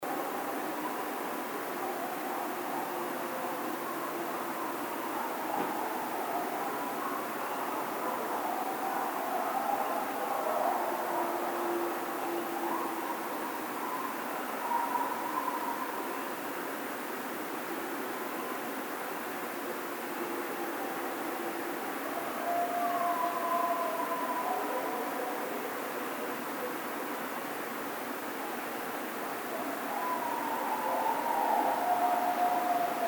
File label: howl, Coyote; Coyotes (Canis latrans)